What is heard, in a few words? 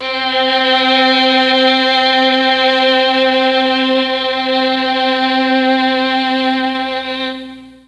C2 3 violins, Mellotron MKII; Mike Pinder - Mellotron Archive CD